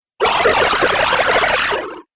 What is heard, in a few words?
Wacky Water Video Game